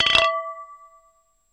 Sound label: Champagne Toast Ding, More Rub, Less Ding; glass clinks